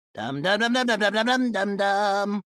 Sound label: singing 1812 overture; junkrat